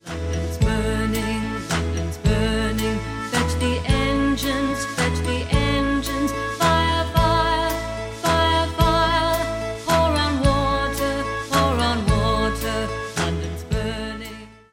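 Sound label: Londons Burning Melody, Harmonica; rounds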